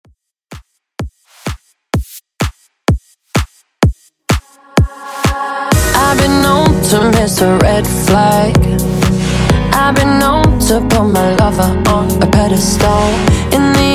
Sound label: In Outro 127Bpm Dirty